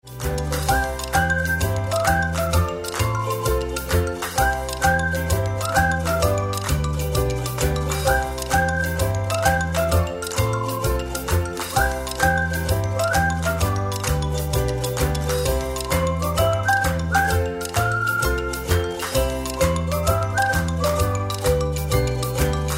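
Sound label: whistle ukulele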